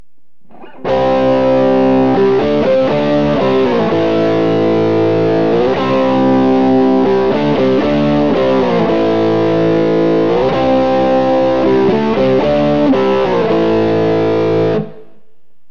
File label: neck crunch; Burny